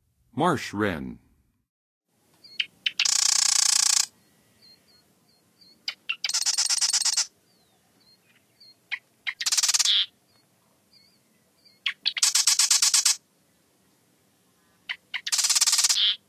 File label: Marsh Wren, Songs of Birds; North America; Western USA; Western USA Songbirds